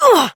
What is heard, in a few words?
Pain, Adult Operator; New War